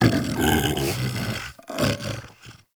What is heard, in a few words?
Vocal Tranquillized; Warframe; Hunting Critters; Legendary Kubrow